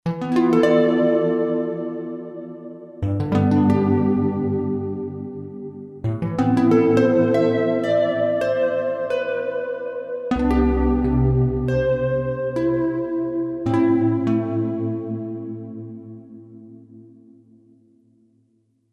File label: Granular Guitars Morphable Nylon Plucker Patch; Steinberg Absolute 5